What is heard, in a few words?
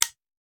Machine Gun Foley; Sound Ideas; Guns